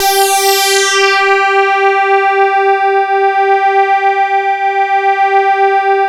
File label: HYBRID, KURZWEIL K2000